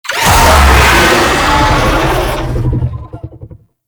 Infested Vocal Attack; Warframe

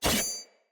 Axe Whoosh Stab; Weapons; Enemy; Axes; Glades Axe

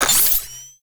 Archwing Power On; Archwing Deploy; Warframe; Cinematics; Rail Jack

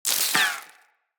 Bullet Impact Debris Dirt; Weapons

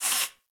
Moa Leg Movement; Warframe

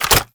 Nunchaku Un Equip; Warframe; Tenno

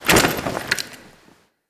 Idle Screen Foley Weapon; Kuva Lich

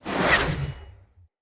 Knight Axe Whoosh Heavy; Weapons; Enemy; Axes; Snc Knight Axe

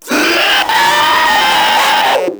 Proto Infested Runner Vocal Attack; Warframe

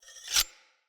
dagger unequip; Weapons; Enemy; Daggers; Sinecure Dagger

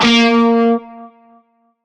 Shawzin Oct Two A Sharp; Music; Warframe; Narmer Shawzin

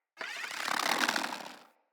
Bow Ranged Charge; Weapons; Player; Hunter; Bows; EnvoyHunterBowRangedMelee

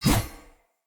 Dagger Whoosh Heavy; Weapons; Swords; Fey

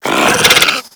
Infested Biped Alert; Warframe

